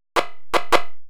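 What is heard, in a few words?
percussion, ambient, 160; eJay; Drum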